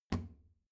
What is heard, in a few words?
plastic trash can close soft